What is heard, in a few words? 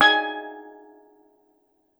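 STEEL DRUM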